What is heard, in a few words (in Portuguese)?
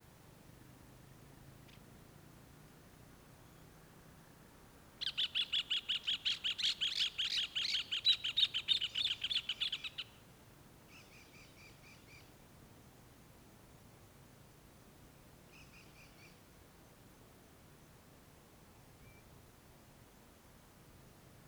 Passaros perto e longe na rua a tarde, dois passaros conversando e vento; aves; leve; Coleção Sonora do Cerrado